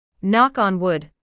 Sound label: nock on wood